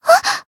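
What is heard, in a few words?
Voice damaged, Japanese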